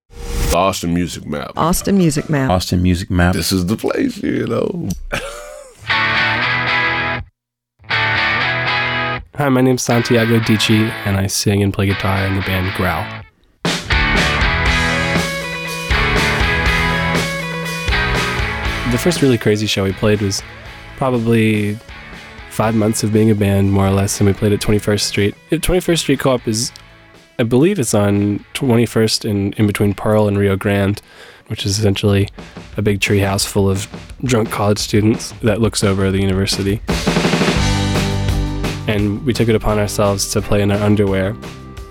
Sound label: GROWL